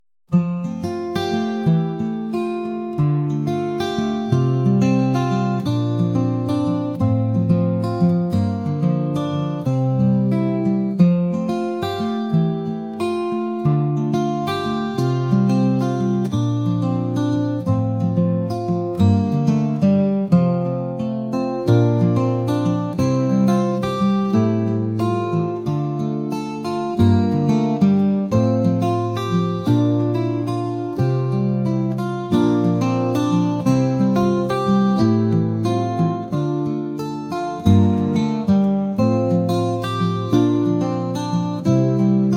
whispers of the wind; krasivaya muzyika

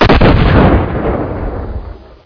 the sound of a grenade exploding; zvuk granatyi

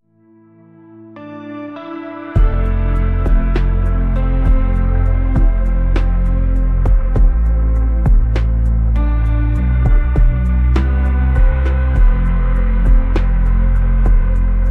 misty echoes; atmosfernaya

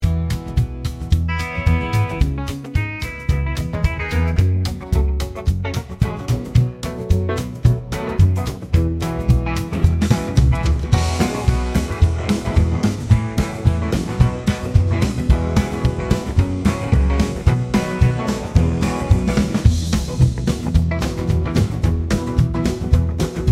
sunshine on the prairie, kantri

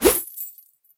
terrible warning wave, nunchak

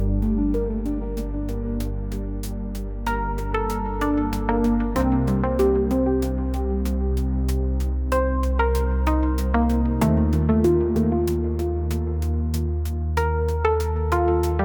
whispers of the night, krasivaya muzyika

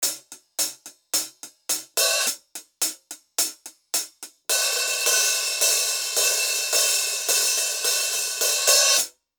drum loop cymbals; muzyikalnyie instrumentyi

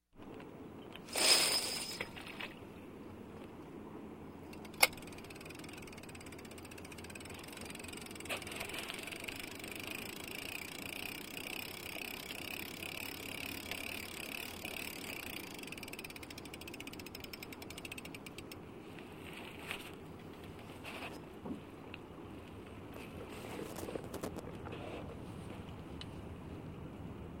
sea fishing

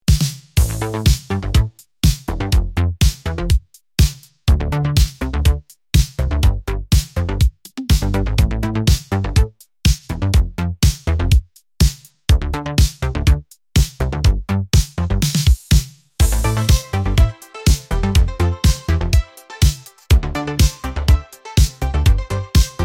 dancefloor whirlwind

